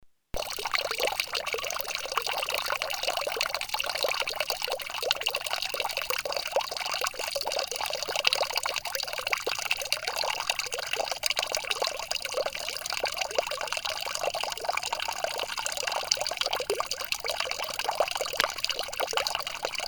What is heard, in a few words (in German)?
Brunnen; Der Brunnen beim Haus Ingrüne. Hier rinnt das Wasser in den Brunntrog.